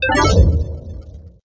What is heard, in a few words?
menu open; firefight